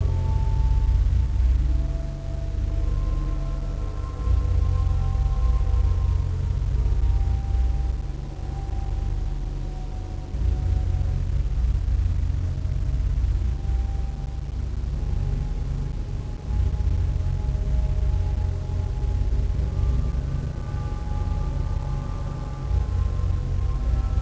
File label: spooky amb